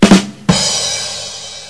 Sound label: rimshot